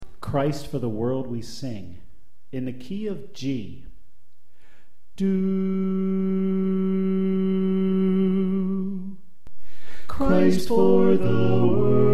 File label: Alto Left; Christ For The World We Sing